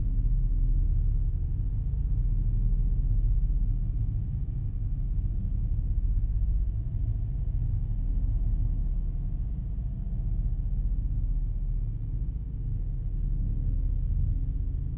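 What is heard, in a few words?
landing ship small room ambience; Star Wars Obi Wan; XBOX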